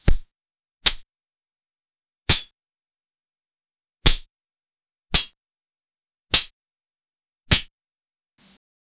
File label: background noise sword fi